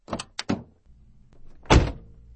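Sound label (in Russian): дверь автомобиля открыли и закрыли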